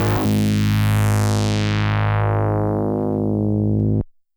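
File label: DX100 G#0; rocket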